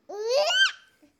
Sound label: Happy Baby Shout